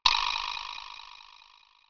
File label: spin